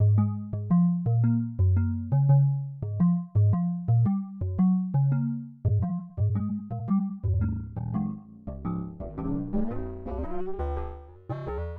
Line 6 HX Effects Synth

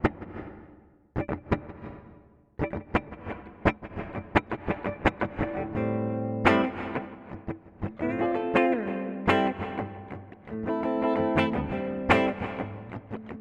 TC Electronic Hall of Fame 2 x4 Room

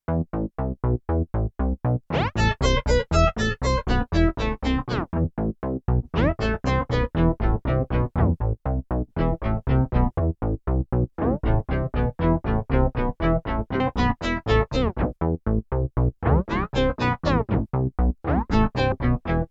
Synthesizer; EHX Pico Pitch Fork

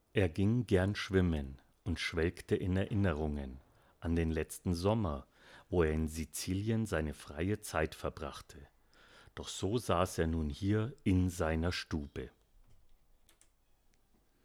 SPL Transient SSL SiX Shure

